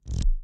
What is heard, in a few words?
Lock Off Target